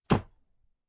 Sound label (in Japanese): 脱いだ服をシーツの置く音